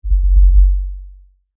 Tremor LFE Rumble, Scifi, Tremor, Lfe, Rumble, Science, Fiction; Sci Fi Sound Effects; BlastWave